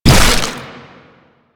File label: Proto Infested Ancient Step; Warframe